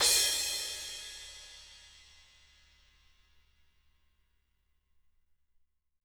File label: crash, crashes